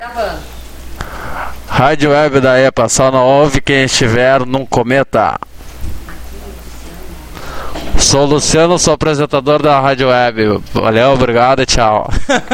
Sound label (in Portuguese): vinheta